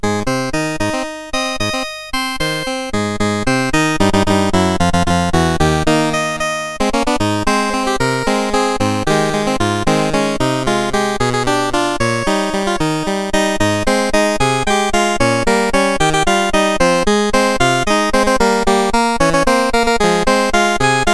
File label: Wiener Schnitzel Waltz, CSG8580R5